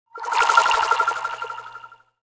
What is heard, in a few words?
Oscillating Sci-fi Transition